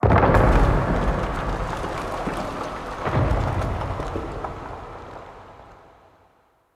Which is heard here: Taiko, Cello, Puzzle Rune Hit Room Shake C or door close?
Puzzle Rune Hit Room Shake C